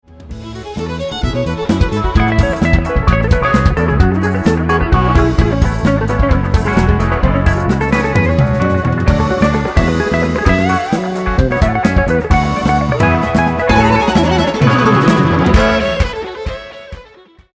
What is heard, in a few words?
New Country; Lickantropo; Andrea Palazzo